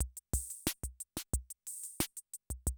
CR78, DrumLoops

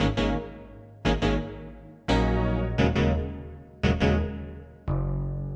SYN, Hip Hop and R&B Drumloops; Best Service ProSamples vol.01; AKAI

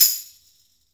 TAMB OP; AKAI S-Series CD-ROM Sound Library VOL-5; GM PERC KIT

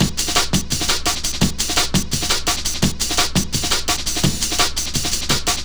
Dope Break, Drum & Bass; Fluid Dynamics

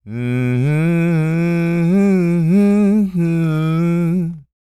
SING, Blues Soul

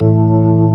FULL SLOW A1, ToneWheel Organ; E-MU Formula 4000 Series Vol. 3; Analog Odyssey

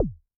BD Rave, Yamaha DTXpress-II Kick

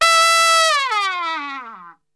E 3, Brass; Best Service; Brass Super Section; TRP LNGFLL

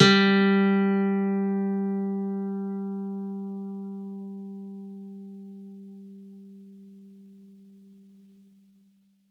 Campfire Nylon Guitar; Worra's Campfire Nylon

